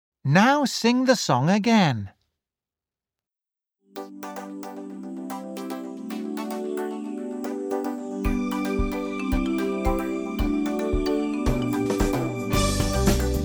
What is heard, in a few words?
Karaoke; Super Minds Level2